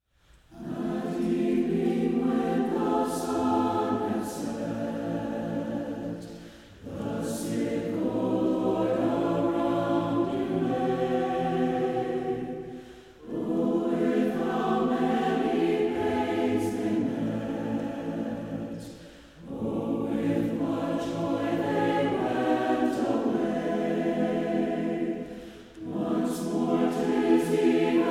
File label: Heal Us All